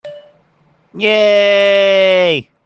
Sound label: YAAAAAAAY